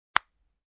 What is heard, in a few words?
Finger Snap